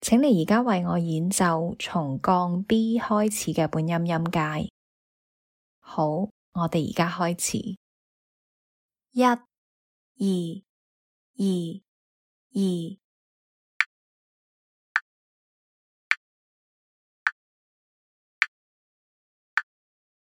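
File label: chromatic scale starting B flat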